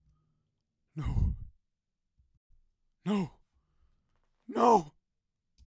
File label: Man Screaming